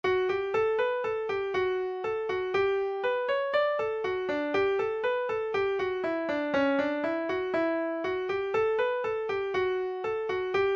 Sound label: american hornpipe, session tune midis